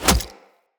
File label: Hunter Bow Arrow Impact Flesh; Weapon Impacts; Bows; Envoy; Combat